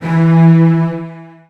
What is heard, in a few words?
E2, Virtuoso Strings, 16TH; ILIO; Kirk Hunter; CL 16TH